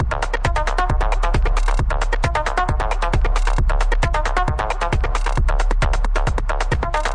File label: 134 bpm, tweaky rolling acid, drum loops and breaks